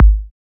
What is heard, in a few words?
drum